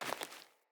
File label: Sandals Walk Vegetation; Player; Footsteps